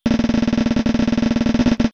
enemy death star; SNES; SMRPG

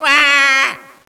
wahhhhh, echo inside caves, underground; DS; ML BOWSERS INSIDE; Luigi

